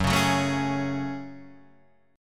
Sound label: F sharp Minor Add 9th F sharp; strummed